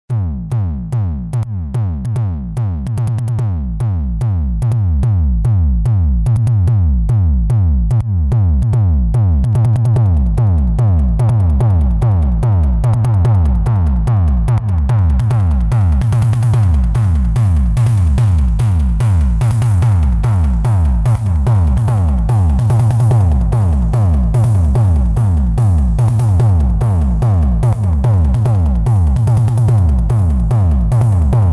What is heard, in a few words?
psy analog kick solo, psy trance